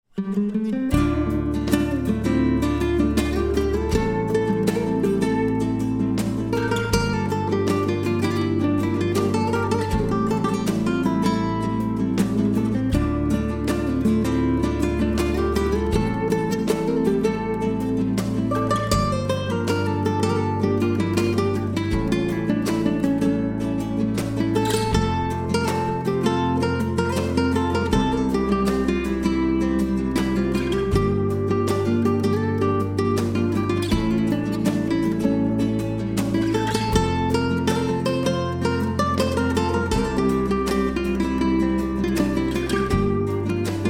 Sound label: Pop solo chords arpeggio